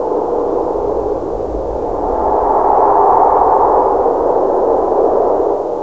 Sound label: long wind; SINDEMO; environment